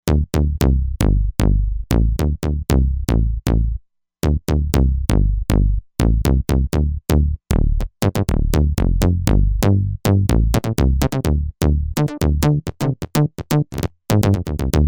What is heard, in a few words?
Bip